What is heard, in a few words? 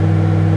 running, microwave